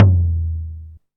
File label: Tom, Sequential Circuits Drumtraks; drum machines